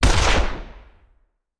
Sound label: Rifle; Sound Ideas; Guns